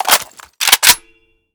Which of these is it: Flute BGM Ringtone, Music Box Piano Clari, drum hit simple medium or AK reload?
AK reload